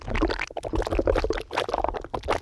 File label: quicksand; Golden Crayon Sounds